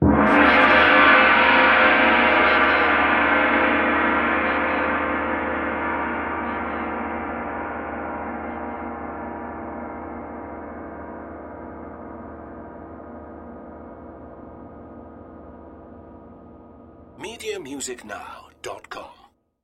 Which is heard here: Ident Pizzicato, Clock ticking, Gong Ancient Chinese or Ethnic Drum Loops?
Gong Ancient Chinese